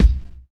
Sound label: Small Reverb Bass Drum One Shot B Key, Royality free kick drum single hit tuned to the B note